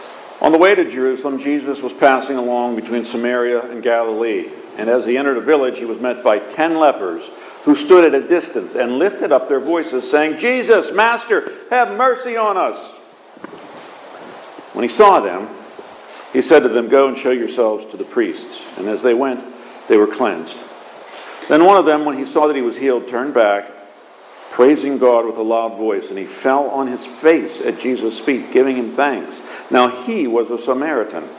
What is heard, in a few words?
Gospel